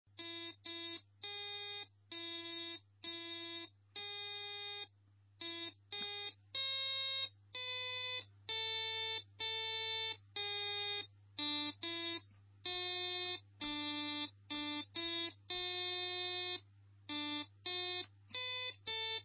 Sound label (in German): ne555 oktave